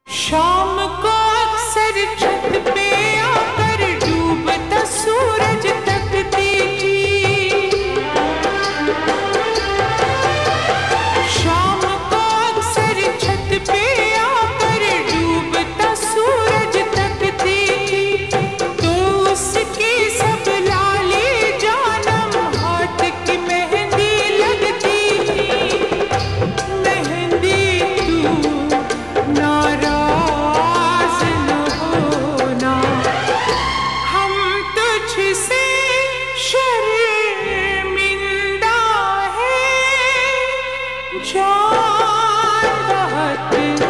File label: slowed reverb